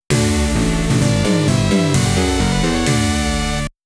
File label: STAGE CLEAR; Bravoman